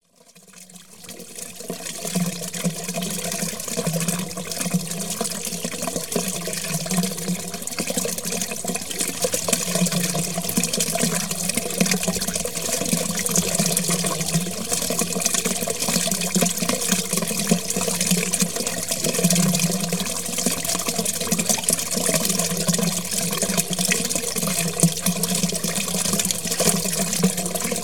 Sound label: Water flowing down drain- start, stop; The BBC Sound Effects Library